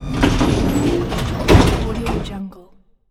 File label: industrial door; difed